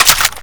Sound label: galil clip out; weapons